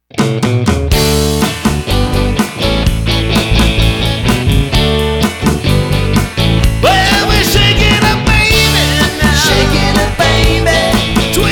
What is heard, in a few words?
Classic Rock Band; Los Angeles Classic Rock Band